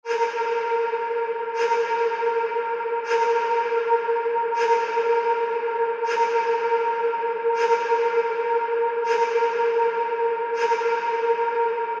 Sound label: Vocal Ambience - 160 BPM A#; Vocal FX; Cymatics; Euphoria Vocal Sample Pack